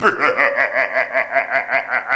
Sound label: Bad Lough